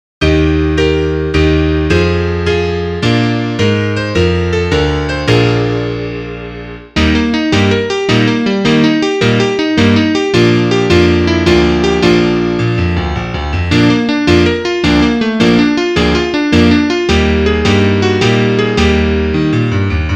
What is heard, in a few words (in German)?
Klavier Solo, trompete; Vivace